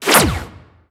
hole bomb throw; littlehorn

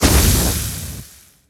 beast fire ring burn

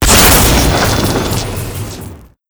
blood laser long start

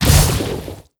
beast lava ball splash

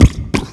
blobby wiggle

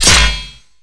tooth and nail

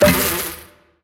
jar of flies

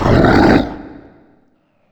death summon skulls

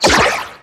friendly ball capture

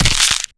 bone snap

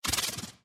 teratoma bounce medium

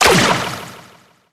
teardeath big; steven

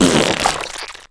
megafart; megafatty

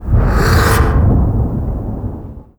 portal start

